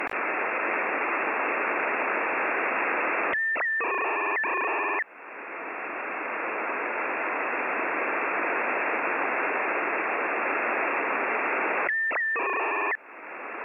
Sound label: Weirder beep